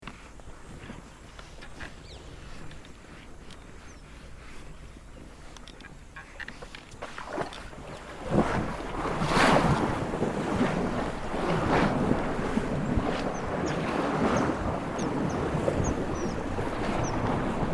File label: river crossing